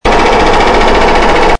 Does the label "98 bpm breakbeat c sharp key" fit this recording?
No